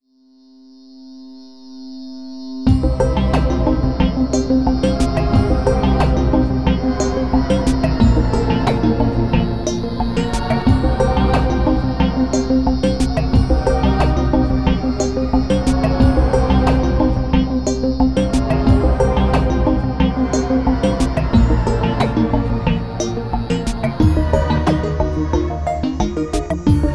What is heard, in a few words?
outro ethereal